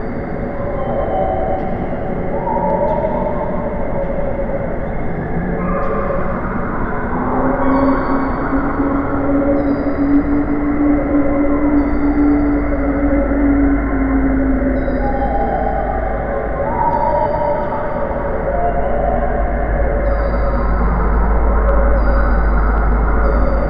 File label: alien wind